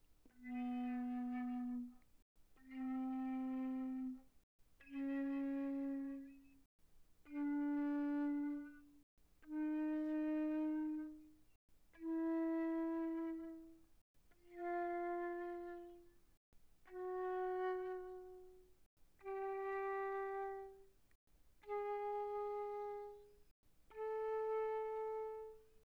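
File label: Flute non vibrato pianissimo B3 B4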